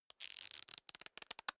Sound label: small book riffle through